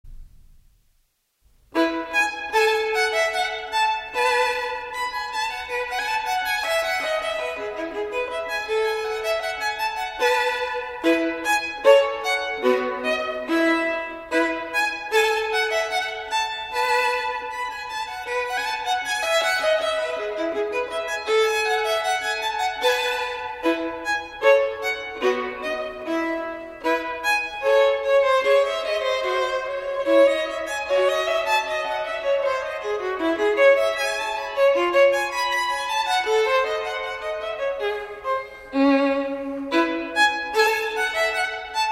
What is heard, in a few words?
Gavotte en rondeau, Violin Partita No. 3; Bach, Johann Sebastian